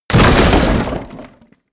break wood medium

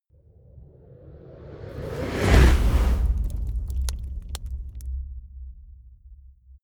whoosh fire torch ignite

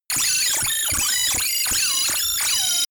infected by viruses

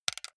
console controller xbox rb creaky press

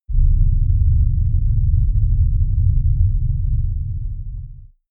avalanche rumble

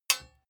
sword sabre guard parry soft short

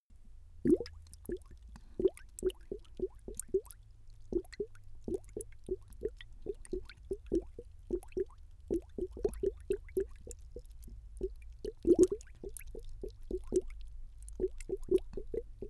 bubble

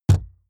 wood impact hollow large

